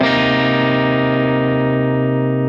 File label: Bm7 Arpeggio down